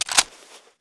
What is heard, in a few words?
mag out foley, mp5; weapons; gmod; kryceks swep; navaro mp5